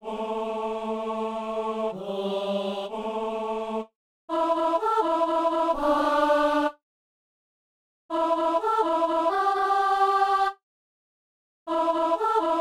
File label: alto; Messe de Saint-François-Xavier - Anamnèse